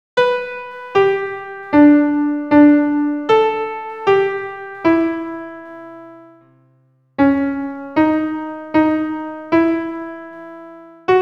alt pian seul

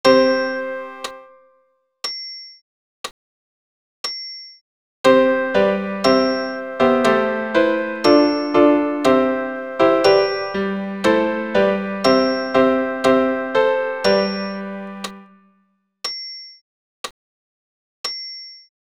Et in spiritum tous pian

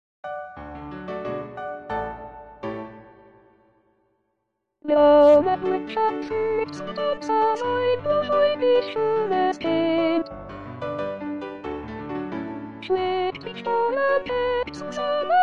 sop paroles

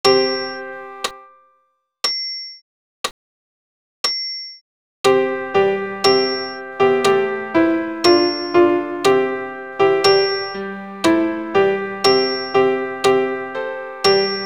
Et in spiritum alt pian acc

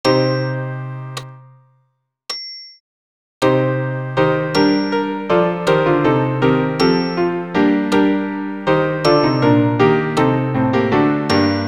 Credo tous pian

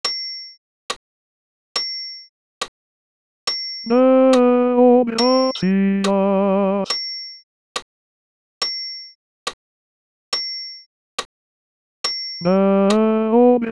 Ite missa est bar voc seul

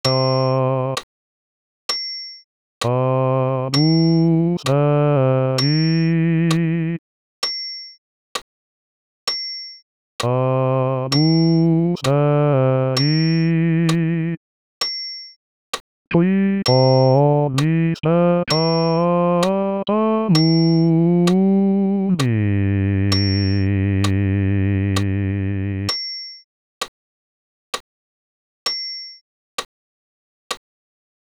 Agnus bas voc seul

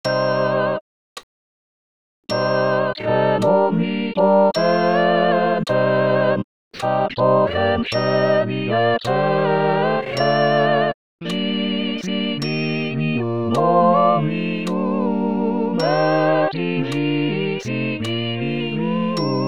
Credo tous voc

